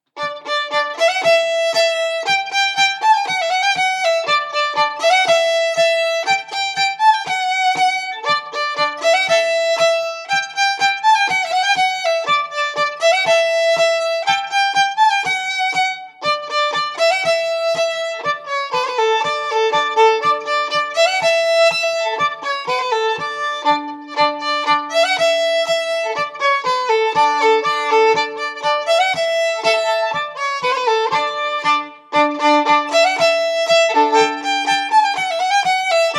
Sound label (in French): Bourree